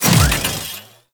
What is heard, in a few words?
Mech Melee Swing Start; Nechro